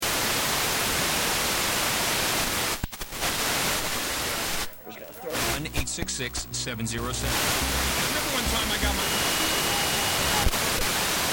Quasar TV VHF Tuning Slow, Television being tuned, white noise; Television being tuned, white noise and snow with various channels dropping in and out.; Noise Sound Effects